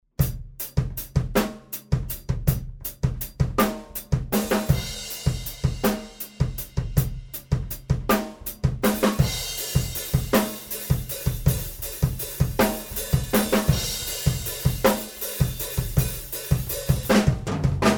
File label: Room Mics Gemini II